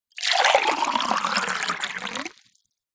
food drink water pour into glass

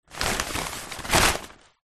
foley paper sheets many crumpled pick up drop; zapsplat

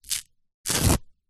household plaster band aid peel from hairy skin

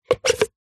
sport cone plastic stacked pull release

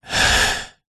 human male middle aged single breath

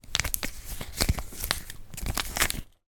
foley sachet small shampoo handling

